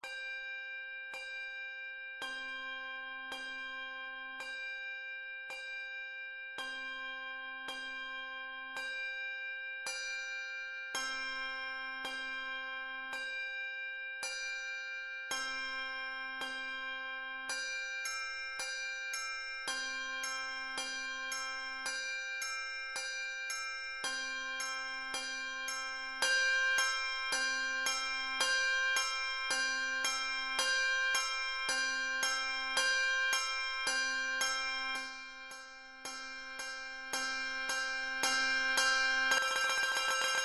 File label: perc; Call To Worship